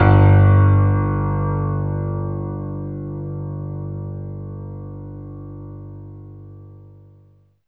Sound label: PNO G1; East West; Phat & Phunky